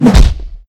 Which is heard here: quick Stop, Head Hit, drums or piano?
Head Hit